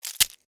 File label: Scorpion Tail Snap, Scorpion, Tail, Snap, Stinger, Attack, Arachnid, Insect, Bug; Scorpions; Sound Effects:Animals:Insects; Blastwave